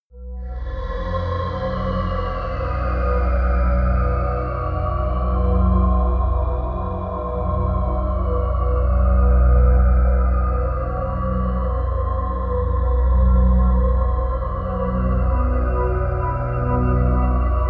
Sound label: drone young ghost